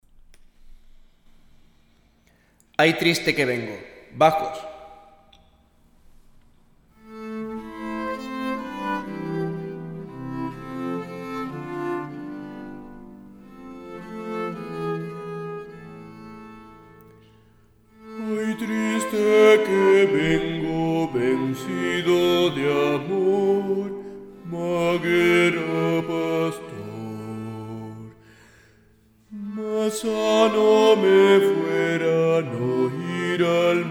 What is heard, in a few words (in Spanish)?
Ay triste que vengo bajos